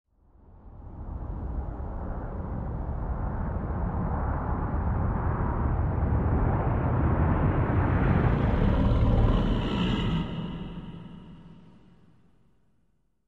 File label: Animal Sting Growl; stingers & whooshes